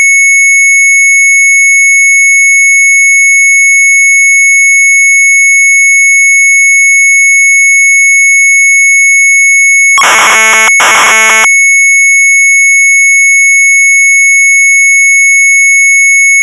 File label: Sharp MZ800 Tape; CALC.&HANDEL. 1; retromelsarchive